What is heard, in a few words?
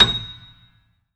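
A#7, grand piano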